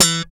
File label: BS POPS P, Slap